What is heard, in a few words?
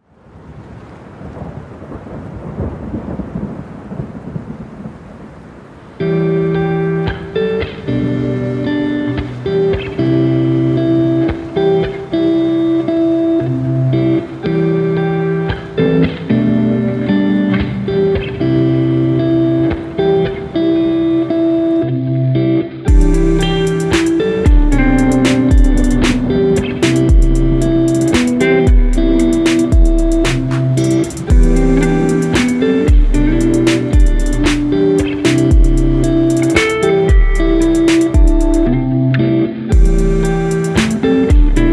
Lofi Chilled Beats